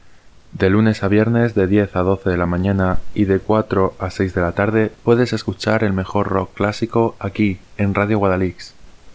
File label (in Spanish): rock clasico